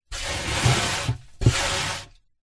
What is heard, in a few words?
foley mud dirt dusting on wooden box scrape off by hand internal pov coffin